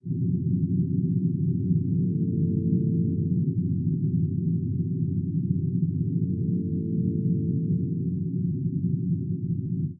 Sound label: penalty ambience